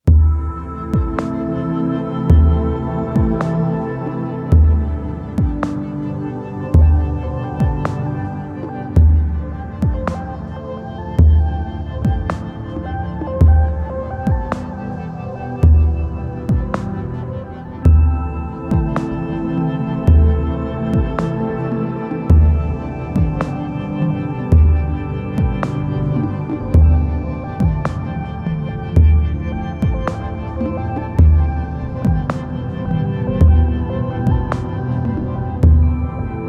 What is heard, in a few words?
Pad